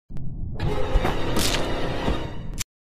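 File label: svernuli sheu, neck snapped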